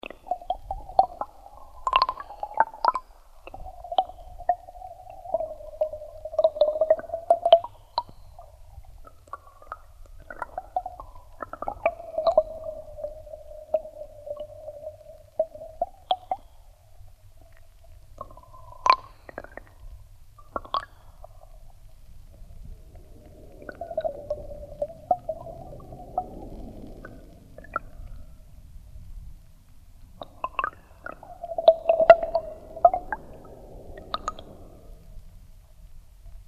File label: oristano flowing water in channel, underwater